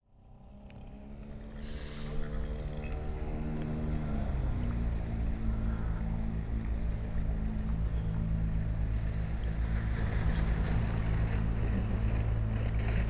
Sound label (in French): Bateau Et Glace; Pont Du Dognon